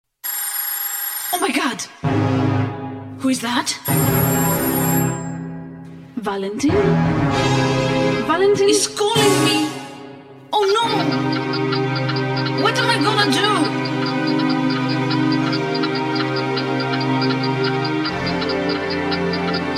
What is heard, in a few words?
suspense ringtone